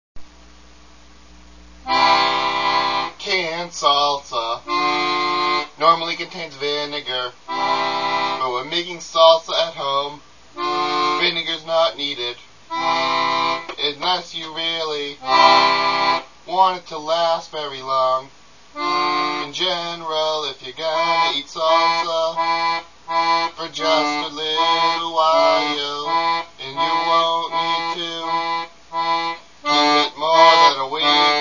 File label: salsa